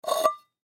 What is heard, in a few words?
Tin Can Pick Up, Tin Can Empty Pick Up Scrape Cement; Foley; Blastwave